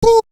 Beatbox; 90 sSampleCDs; Coldcut's Kleptomania VOL1

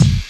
KIT, Dance Mega Drums, HIP

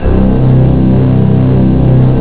poly bass; jazz jackrabbit 2; M8

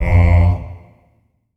Staccato F F2 R, Symphony of Voices; Spectrasonics

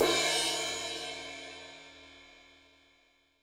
HiHat & Crash, Roland TD-20; HiHats

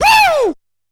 B3 Whoow!, Yamaha PSR-E323 Sound Effect Kit

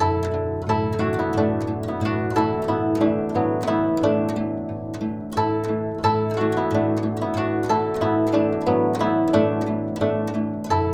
GUCHIN 90 D; INIS - Opium

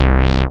SYN SH, Roland; Sound & Vision - Gigapack I CD 2

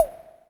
Percs, Elektron MachineDrum; SPS1 MKII

